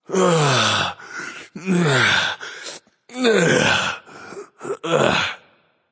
nv breath; smite; voicepack; xbalanque

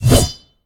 stab; Smite; god Lancelot

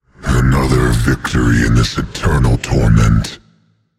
"yay"; smite; voicepack; set

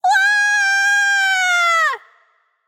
fear light; smite; voicepack; hel

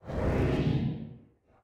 swish; smite; god thanatos

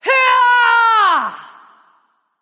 battlecry; smite; hera

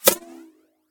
pwr basic impact; smite; Anhur

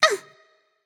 ouch; smite; pele

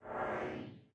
swish; smite; god thanatos

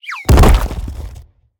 defeat rock drop large; smite; god merlin

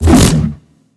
swing small; smite; god arthur

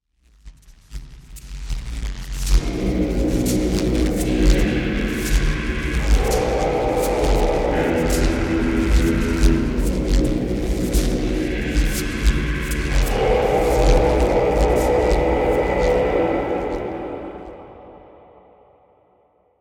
energybed; smite; cthulhu

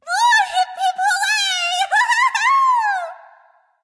yay; smite; arachne

